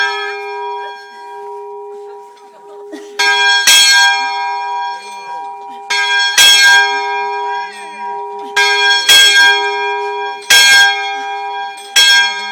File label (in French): Cloche; Mt Moise